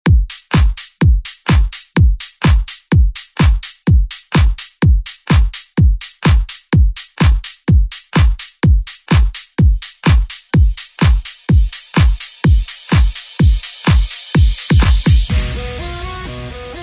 Pop Redrum

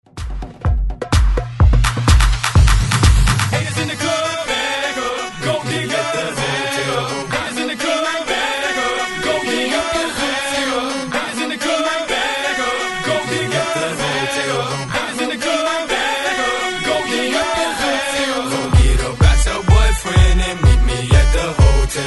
Party Breaks 126 Bpm; DJDOLLS

